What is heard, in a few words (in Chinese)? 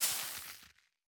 施加骨粉声